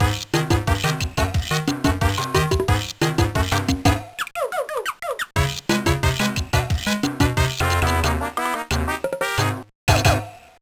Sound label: Jingle; Hamtaro Ham-Ham Heartbreak; gba